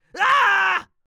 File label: scream; AppleHillsProduction; Levels